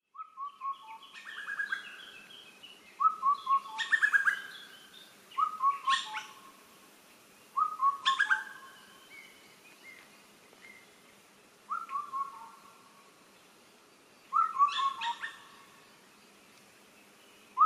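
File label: Indian cuckoo, oita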